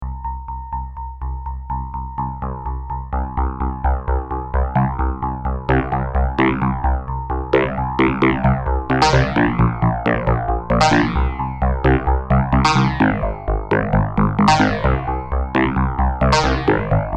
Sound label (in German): Reface DX Dynamikbass